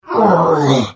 Hit; The Elder Scrolls III - Morrowind

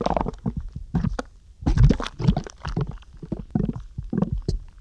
mud bubbles; The Elder Scrolls III - Morrowind; Morrowind GOTY GOG

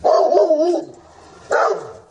Big vicious hounds

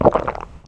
drink; The Elder Scrolls III - Morrowind; Morrowind GOTY GOG

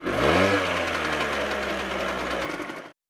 motorbike rev; Papers Please

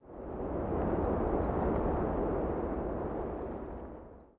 wind DES; The Elder Scrolls III - Morrowind; Morrowind GOTY GOG

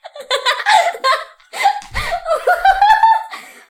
giggles and laughter; SyrinScape

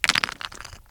Tumbling Stones; SyrinScape

